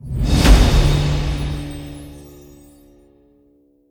big Win Start; netent

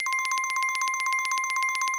Coin Count Loop

